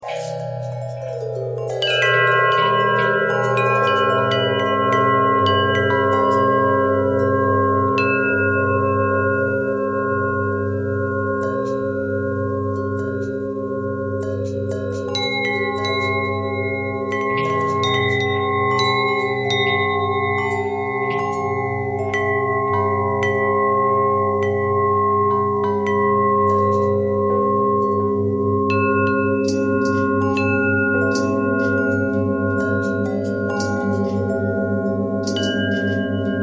bell long bell twist, bells

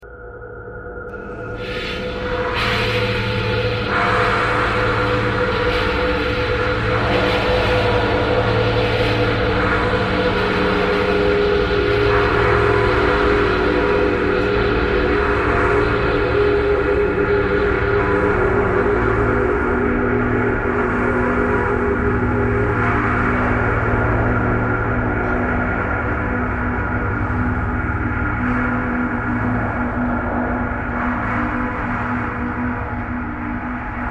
traffic core birds thread noise

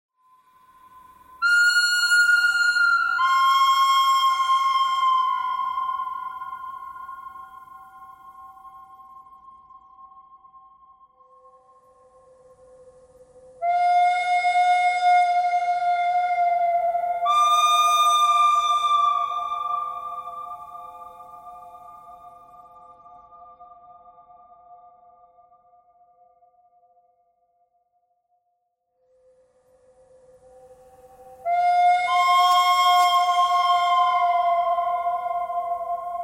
birdcry thread pentatonic; birds

